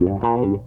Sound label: wha wha, bass